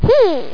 HMM; The Ultimate House of Games 2000

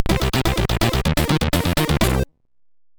Jingles, SIDTunes

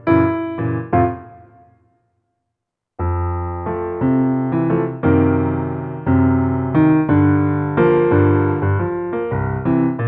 JAZZ; MOBICLIC 57

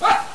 DOG; CatFind

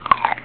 Chomp; After Dark; Chomp 2.0

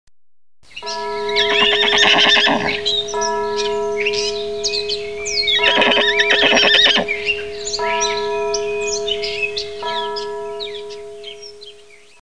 HORSEY

